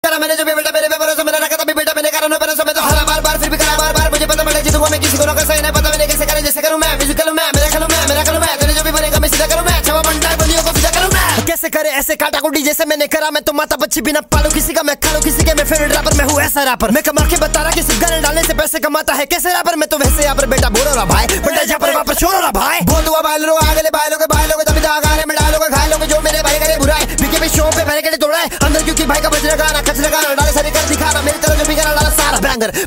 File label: hip hop cypher ringtone